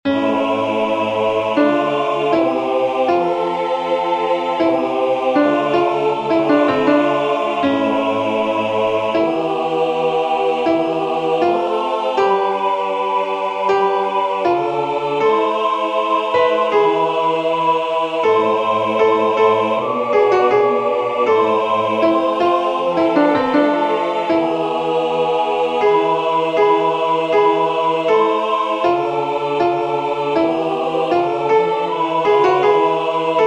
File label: Alto